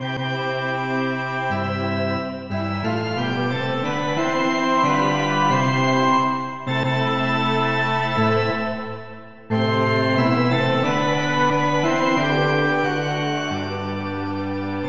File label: polyinstru; hymns; Himnario grande